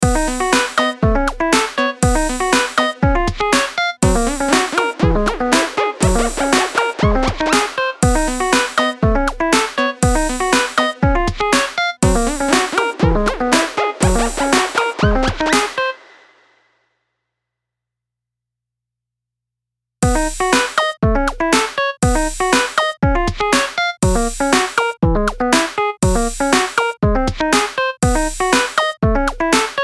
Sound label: QUIRKY Bmin 120BPM